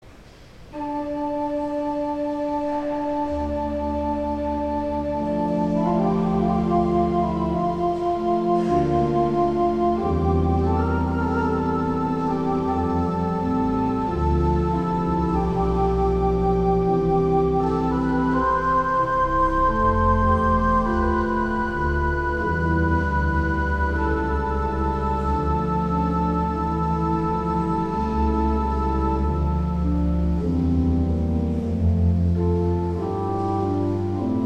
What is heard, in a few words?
Organ, Chorale Prelude; Dietrich Buxtehude; 'When Jesus Went To Jordan's Stream'; St. John's Cathedral, Jacksonville Florida